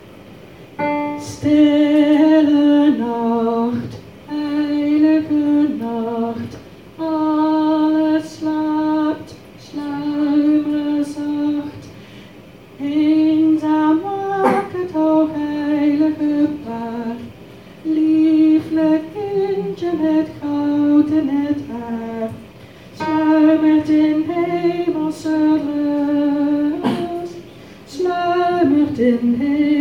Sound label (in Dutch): stille nacht Alt